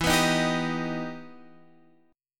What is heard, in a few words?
E Minor Major 7th; x,x,x,9,11,10,9,12; down